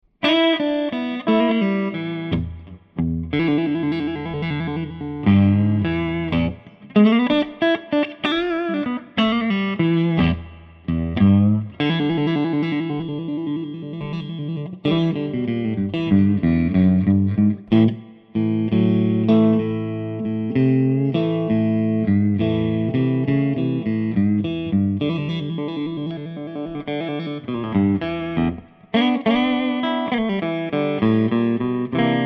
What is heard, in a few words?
relic stratocaster, electric guitar solid body; monroe